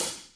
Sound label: normal hit whistle